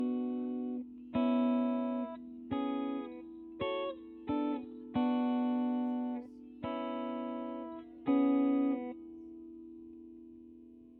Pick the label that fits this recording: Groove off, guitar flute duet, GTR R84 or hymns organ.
GTR R84